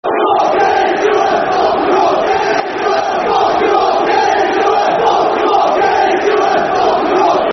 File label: Get Into Em Football Chant; Crewe Alexandra Cafc